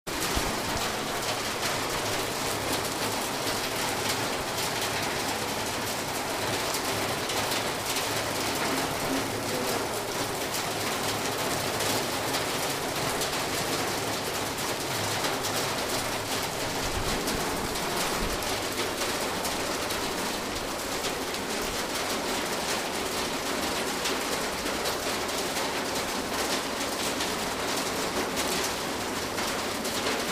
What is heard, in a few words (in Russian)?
дождь по крыше